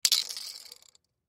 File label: Sun Glasses Slide, Sunglasses Slide Cement; Objects; Blastwave; Foley